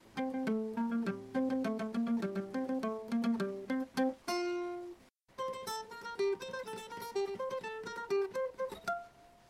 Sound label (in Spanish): jingle; fotoprix